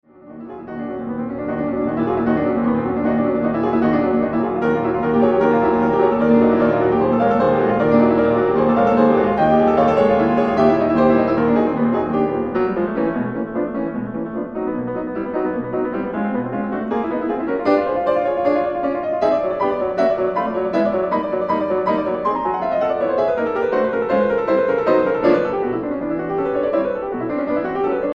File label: Scherzo in E minor